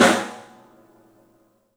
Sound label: STEEL, Drums, ROOM SINGLE; 3D Sound